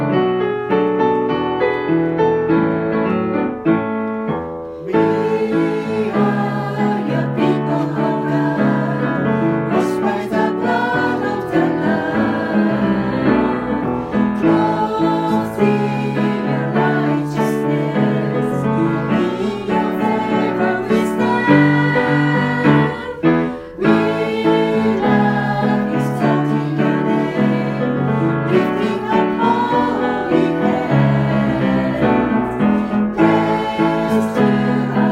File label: Let there be joy Unison